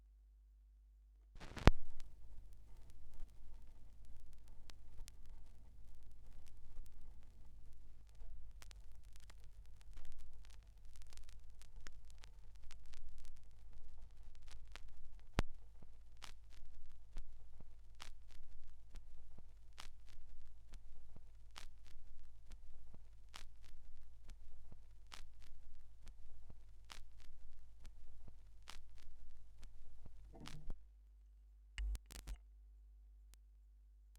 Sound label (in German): schallplattengeraeusche; Vinylknistern